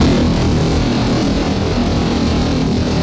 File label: angry machine